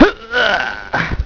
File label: Bleeding Sound; Realmz 4.2.2